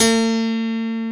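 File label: dance piano A2; Best Service Advanced Media Trax Modern Composer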